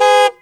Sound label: big horn, 汽车; 交通